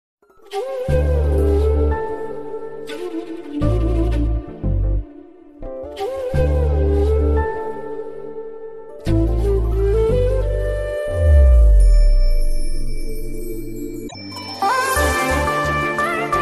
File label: malayalam ringtone